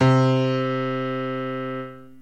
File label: Piano Key C